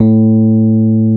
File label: A 4, Electric Pianos; Wizoo Electric Pianos